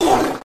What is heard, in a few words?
skill end